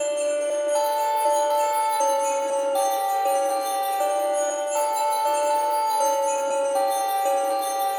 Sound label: Synth Bell 120bpm A